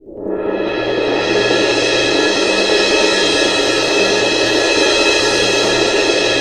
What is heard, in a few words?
CYM, Percussion; ILIO; Synclavier Percussion 2; World & Orchestral